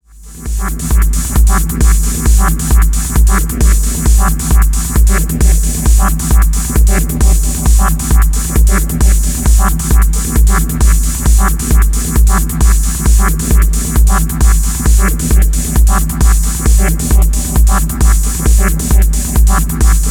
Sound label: Loop (locked groove)